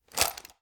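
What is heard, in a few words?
weapon assault rifle ads up; weapons; gmod; viper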